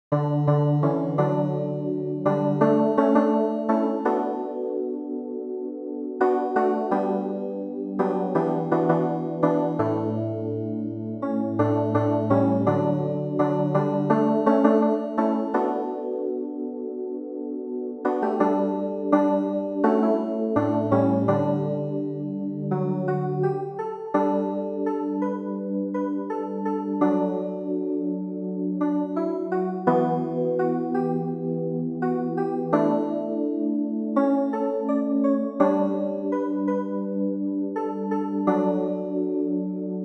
CLAVIER; CelesteJerusalem

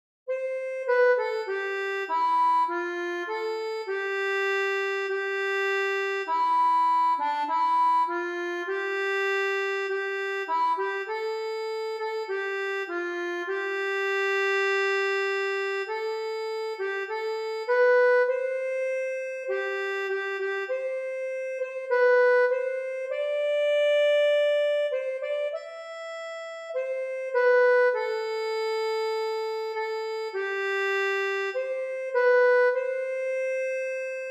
ACCORDEON

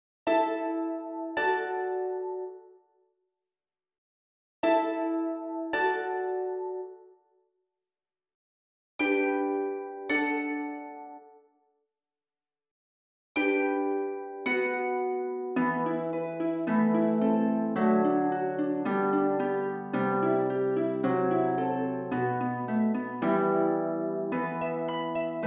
HARPE

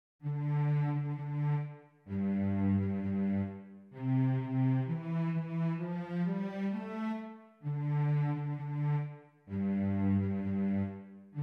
VIOLONCELLE

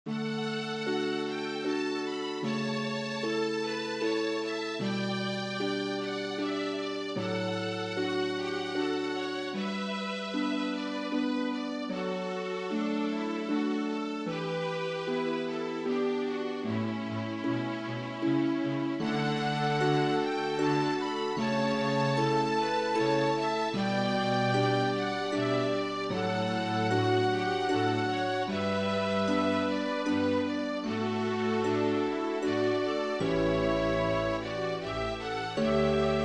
ORCHESTRE

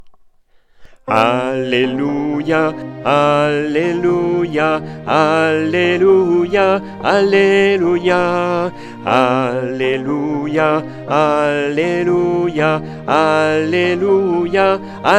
ALLELUIA ALTO; ALLELUIA "Colombière"

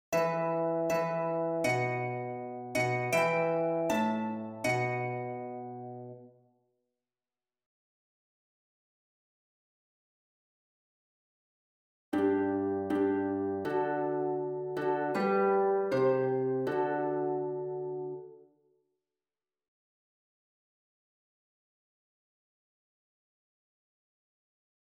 ANAMNESE HARPE

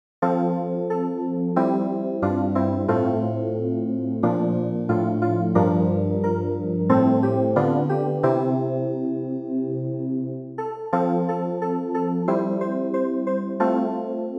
CLAVIER; Tu Es Pretre a Jamais

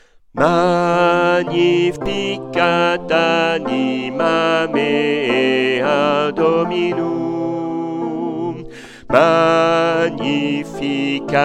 ALTO; Magnificat

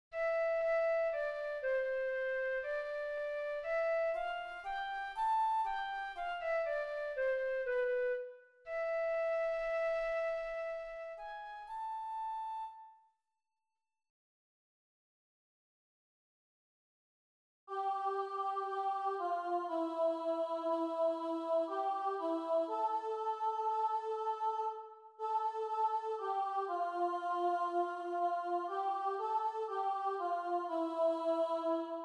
FLUTE

